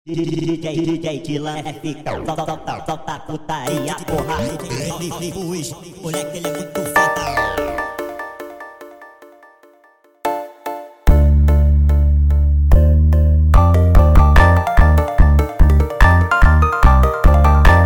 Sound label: Funk instrumental Speed Up